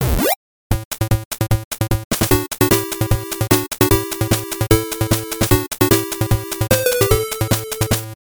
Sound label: 8bit start; Shooting Love 200X Xbox One gamerip 2009